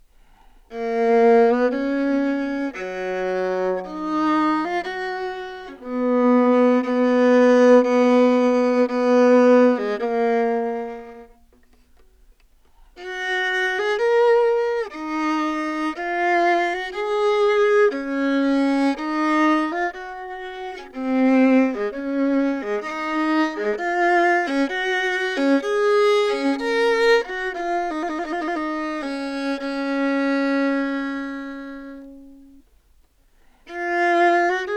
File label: baroque viola, Anechoic; telemann; OPENAIR